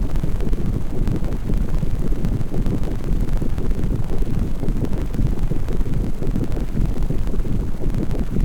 Rewind Warble

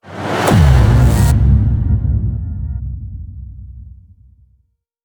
Powerup Appears

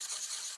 Sketch Env Write

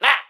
Pecheneg Gray Damage

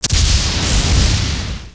Ice Attack; Knight Online

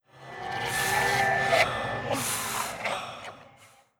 Monster Altar

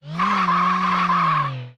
spider boss move long

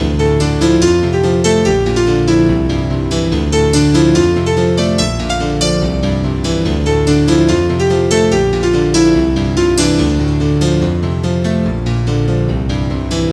Amb Piano tune

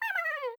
Grub Idle Or Look; Grub Idle Or Look 1

